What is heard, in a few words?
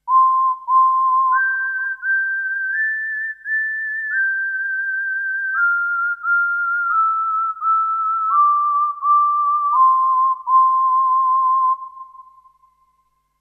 whistle